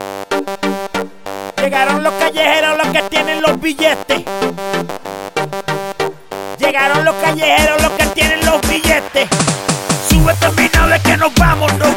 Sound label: Kit G Full Loop 95BPM